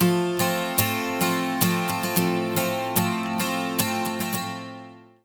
110 MAJ 12 STR, Acoustic Guitar Loops; USB Soundscan vol.04 - Electric & Acoustic Guitar Loops; AKAI

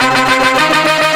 Horn Sect Effect

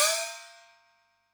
GONG, Yamaha DD-65

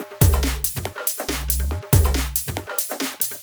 drum Loop 140BPM no kick; Vengeance - Essential Clubsounds Vol.5; VEC5 Drumloops; VEC5 Multi Loops 140BPM

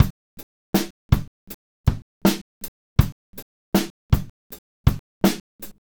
Live Beats, R&B Drums

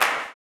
CLAP, blues & soul; ILIO; Vocal Planet

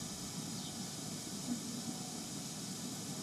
Ambience Loop, outdoor

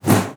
BOW PERC, BASS FINGER; Xsample VOL-5

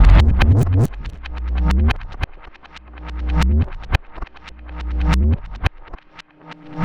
Reverse Percussion, percussion loops; Drum n Bass; KJ Sawka; Drum n Bass & Beyond; Drum Tool Box

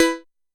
Voyager Bell, Moog Voyager

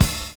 FILL; Univers Sons - Kitch 70s

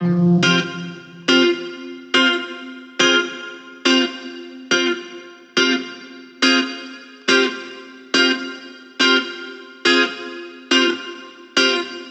140BPM Fmin Guitar FX, Offbeat Dub Guitars; Antidote Advent

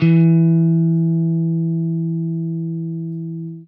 JAZZ, Guitar; Quantum Leap - Guitar & Bass

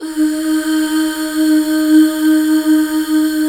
UUHH D#4; Best Service Gigapack 1

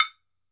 TOKE BELL, Drums&Percussion; E-MU Producer Series Vol. 7 – Old World Instruments

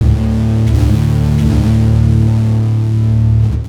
Bass FX

